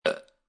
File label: Burp Short Burp Male, Human, Burp, Short Burp, Belch, Male; Burping